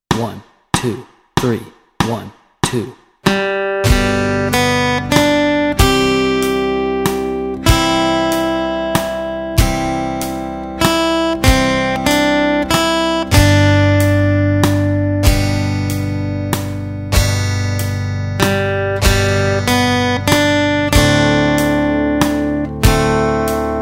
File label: On Top of Old Smokey Melody and Chords Track, guitar